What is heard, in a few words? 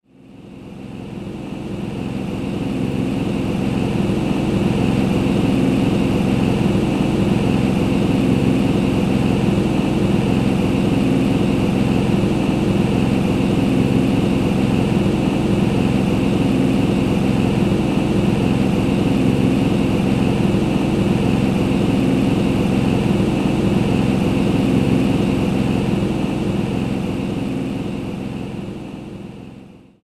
cooling fan; sound oasis